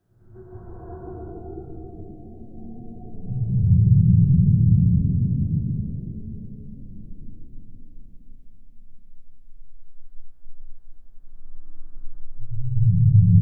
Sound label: subterranean vast cave of